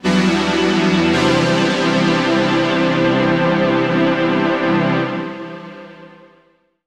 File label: Waddle Pad, Pads; Zero-G Smoke